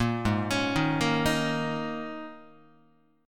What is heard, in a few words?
Ab Suspended 2nd Suspended 4th Ab down Guitar; arpeggiated; JGuitar